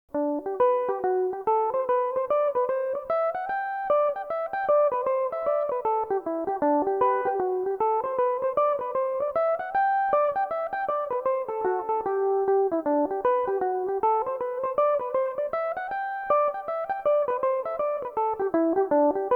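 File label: green bridge G major, irish mandolin; Belmuse EM-190 electric mandolin; The Green Bridge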